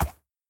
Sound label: soft, horse; mob; minecraft